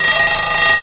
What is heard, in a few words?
alarm ring; interactivateJS; Stopwatch